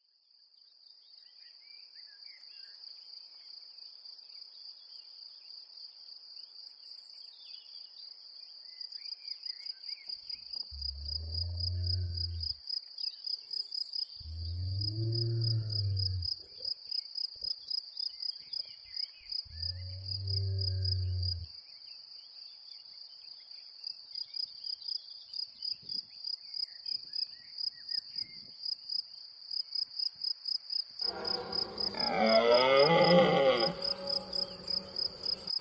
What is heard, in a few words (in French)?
musique electro-animale; la classe l'oeuvre; mix